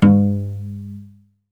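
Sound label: SOLO CELLO, Synclavier Strings; ILIO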